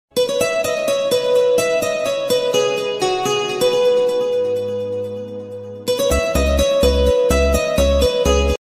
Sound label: best flute instrumental ringtone